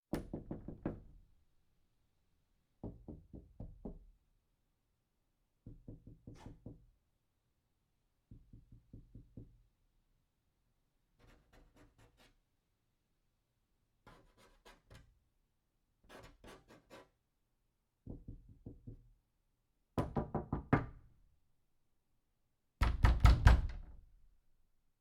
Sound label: knock on wooden door